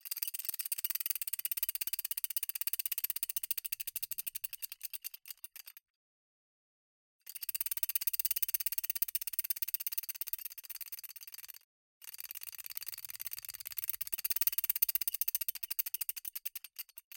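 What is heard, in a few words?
Mechanical Gear Tiny Rotation; MECHGear; Stereo; Justsoundeffects; Steampunk Gadgets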